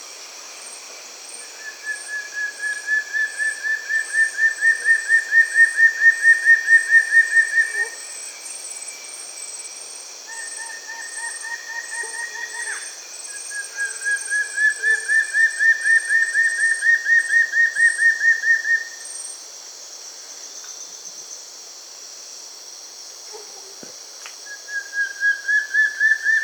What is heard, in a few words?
Frederickena, پرنده فردریکنا